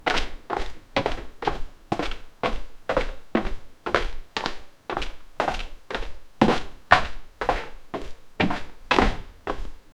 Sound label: steps on a parquet floor, domestic